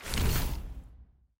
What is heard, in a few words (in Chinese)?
邀请信出现; nba